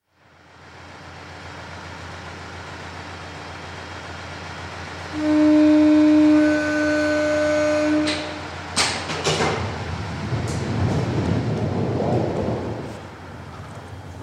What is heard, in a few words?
Lifeboat, launch (Recorded on board) (48ft 6in Oakley class self-righting boat); RNLB 'Charles Henry'; BBC